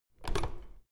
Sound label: door plastic handle pull down creak soft